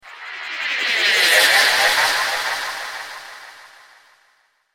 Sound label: Magik Reborn, magic sound effect; fantasy sound effect; Magic Sound Effects; role playing games, magic videogames; Andrew Fleming